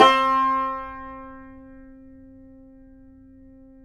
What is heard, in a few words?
yayli tambur